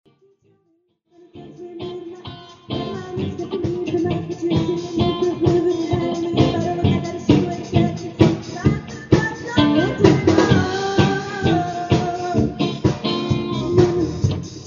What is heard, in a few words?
funky blues